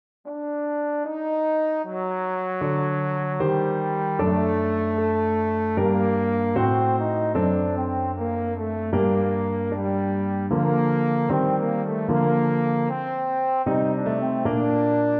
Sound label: sonata, trombone